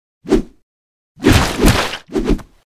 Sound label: attack; hero sound